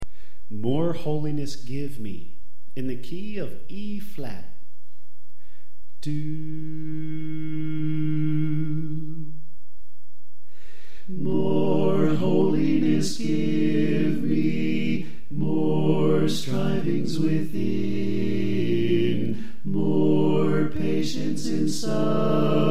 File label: Alto Left